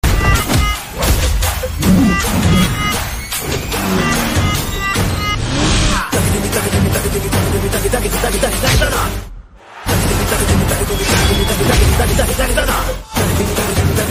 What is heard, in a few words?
movie bgm ringtone; liger